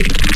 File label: Burp, Serum Wavetables; Synthetic's Serum Collection; Cymatics - Nuclear for Xfer Serum Wavetables